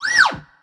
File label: Screech Verb